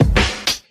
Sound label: doorclosing, Kick Snare; Drum loops